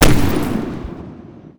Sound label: battle item Spidrtrap boom